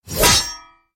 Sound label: sword slash